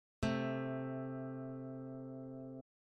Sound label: intervals harmonic major sixth, guitar